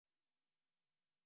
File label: trumpet